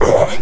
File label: Pain; player; skelebot